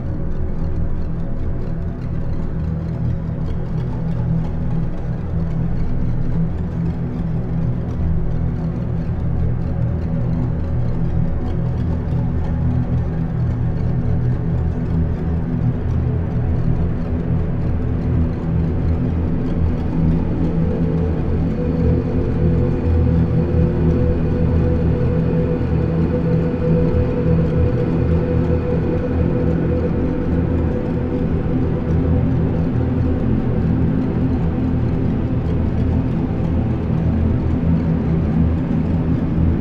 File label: Fear Loop; Face It - A Game to Fight Inner Demons